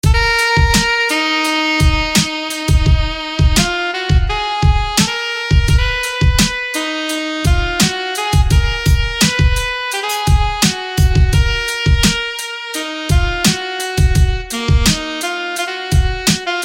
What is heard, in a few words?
Saxophone Alto; SFB FX